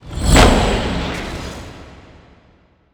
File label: Car Jump Whoosh; Burnin' Rubber 5 HD